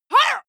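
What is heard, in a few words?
female combat attack short, screams; schreie; menschen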